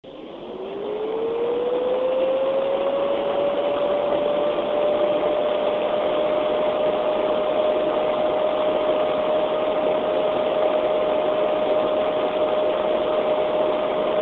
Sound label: vp4c7040